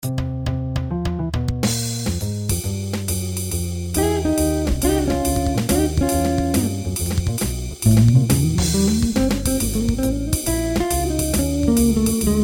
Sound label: jazz improvisation